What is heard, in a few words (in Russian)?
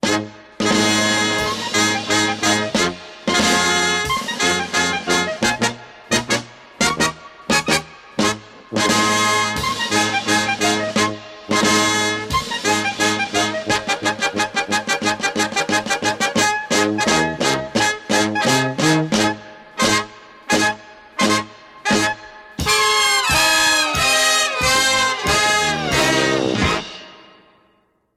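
cyrkovaya muzyka, circus music; Звуки и музыка цирковая; весёлые клоунские мелодии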